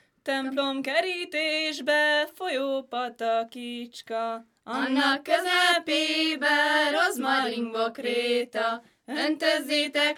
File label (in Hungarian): nepdal; templomkeritesbe folyo patakicska